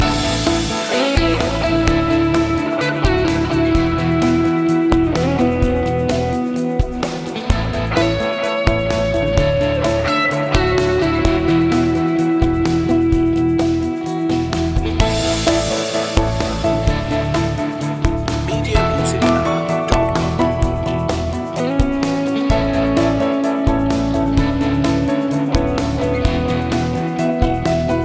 sidewalk rock loop

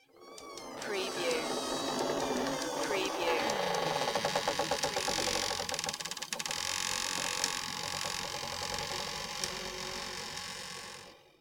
Creak Long

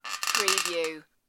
metallic bed squeak

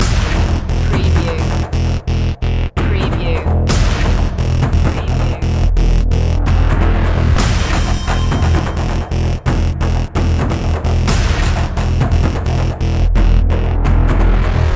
trailer madness loop

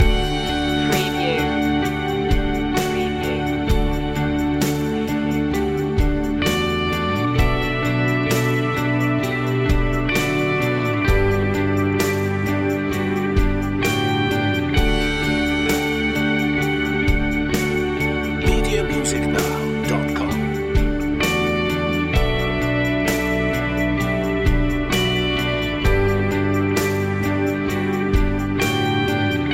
drifting loop

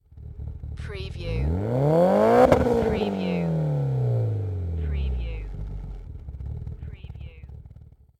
MITSUBISHI EVOLOUTION EXHAUST SINGLE REV

